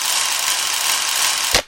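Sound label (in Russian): механизм тасования карт; mehanizm tasovaniya kart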